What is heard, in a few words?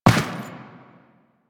footstep dirt; Enemies; Warframe; Teshin; DE